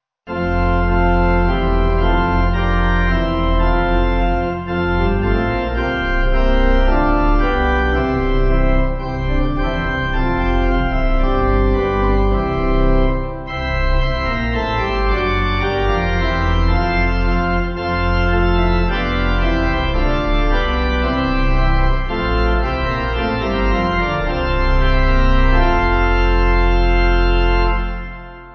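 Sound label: Pipe, Pipe Organ; Come Pure Hearts; Alles Ist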